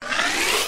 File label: zombie digging out; mvm forever